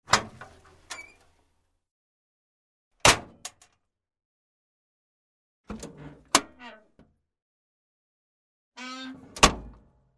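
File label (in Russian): fire truck compartment door opened closed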